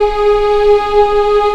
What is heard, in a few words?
ANGELIC G#3